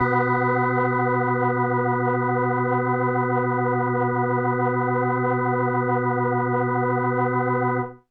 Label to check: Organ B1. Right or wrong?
Right